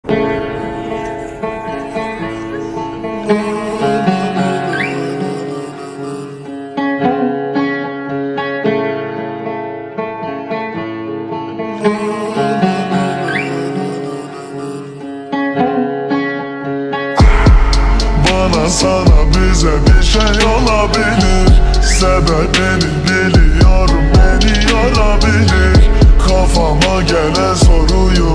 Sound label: slowed reverb